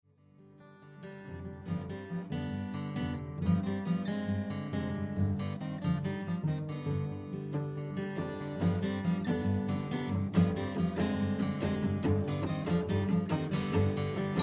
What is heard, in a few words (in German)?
Trio türkischer mokka